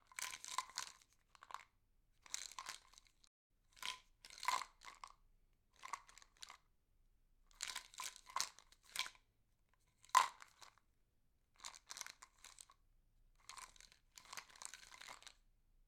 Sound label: Pills